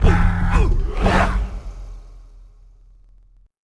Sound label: Goody Hostile; Ambient; Civ4 warlords